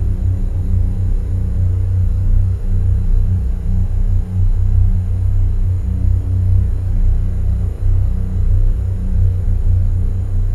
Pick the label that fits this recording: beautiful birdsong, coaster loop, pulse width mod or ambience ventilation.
ambience ventilation